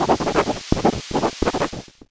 write

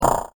clicks

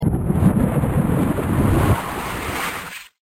thunder

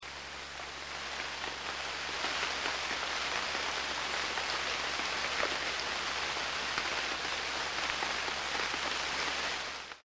rain shower

